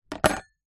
household cutlery metal single item place into plastic container